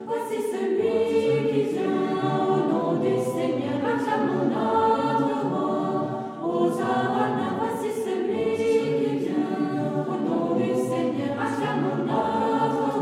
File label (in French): Voici celui qui vient couplet 4